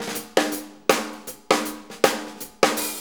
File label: Shuffle Fill, Drum Tools